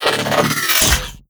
retract, npc manhack; Half Life Alyx

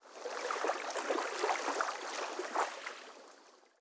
river lap; ambient; anubis

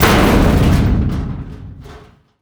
barrel fall; Counter Strike Model Pack; CS:GO; survival

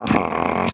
mannequin grunt; headcrab classic, scripted; creatures

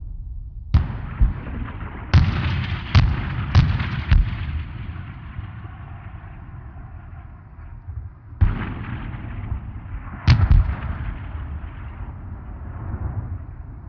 battle loop, explosions; Half Life Model Pack; Half Life 2

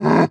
warning, zombie vox; creatures

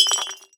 pottery impact, glass; physics

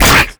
attack grunt, headcrab black; creatures; vox

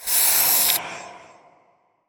spray; halloween

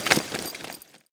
suit, footsteps; player

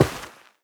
step, footsteps, player, carpet

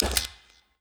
inventory select; player

